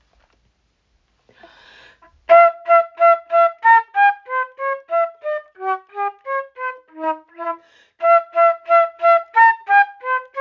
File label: Train chugging flute